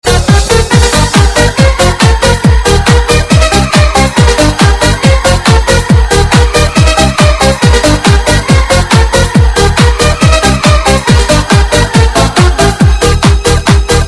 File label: Loop